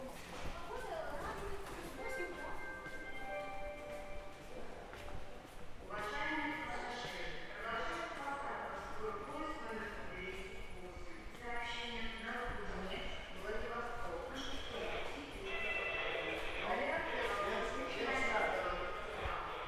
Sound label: Krasnoyarsk Train Station Announcements; travel sounds